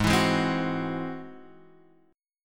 G sharp Augmented 7th G sharp, down, Guitar, Half Step Down